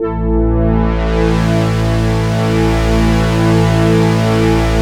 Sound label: MXWALL, MATRIX 12, Vintage Keyboards; Big Fish Audio - First Call Vintage Keyboards